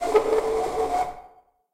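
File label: scratching; Five Nights at Treasure Island 2020 Remake; windows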